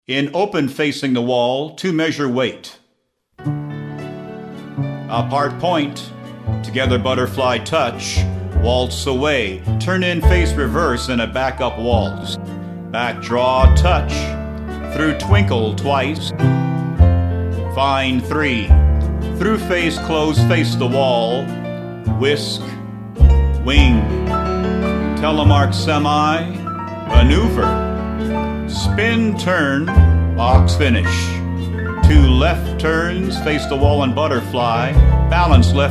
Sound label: vocal sample